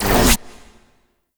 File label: Operators Amp Chassis Part C Fire End C; Weapons; Warframe; Amplifiers